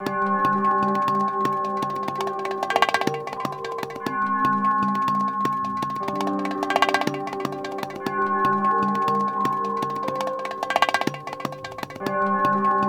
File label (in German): ambient trommel; csp ambient